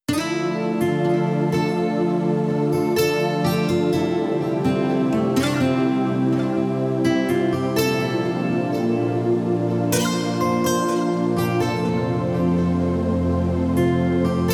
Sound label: Chill Mix